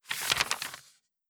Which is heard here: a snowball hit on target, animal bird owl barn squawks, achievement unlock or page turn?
page turn